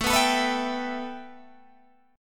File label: A 7th Suspended 2nd Guitar Open G; 19,16,19,16,17,17